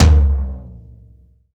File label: subtle reverb tome drum sample c sharp key; Duration 0:02